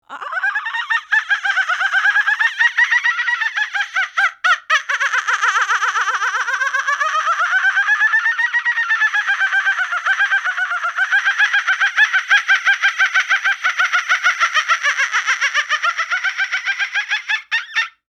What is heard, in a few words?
kookabura laugh